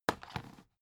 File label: dvd case put down